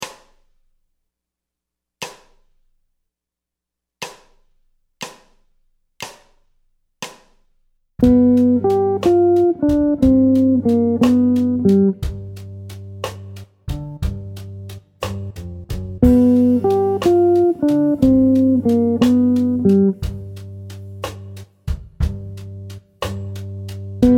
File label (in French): Phrase Accord dom, guitare jazz; Phrases sur accords de dominante non altérés; motifs sur accords de dominante